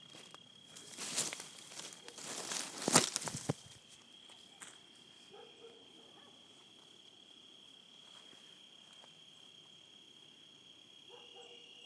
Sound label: cicadas at night